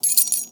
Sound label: Foley